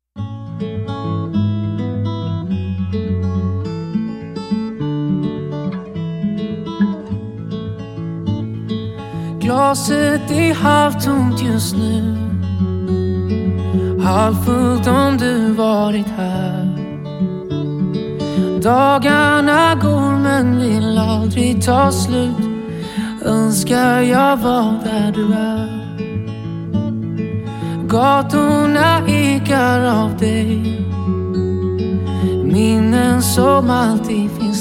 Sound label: Contemporary Jazz